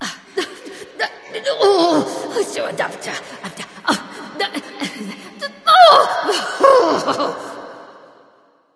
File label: grumble light; smite; voicepack; hel